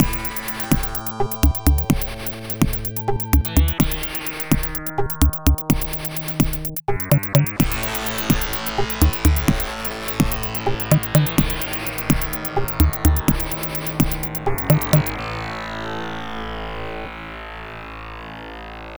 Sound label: Suspense; Toxic Crusaders; Genesis